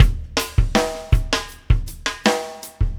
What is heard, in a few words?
Acoustic Drumloop